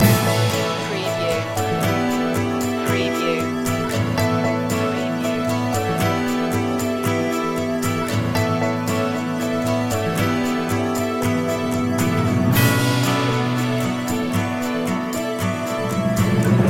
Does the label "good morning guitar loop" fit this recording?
Yes